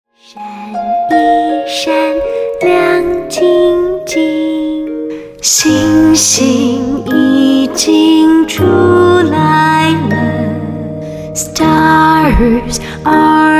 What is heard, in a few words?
lullaby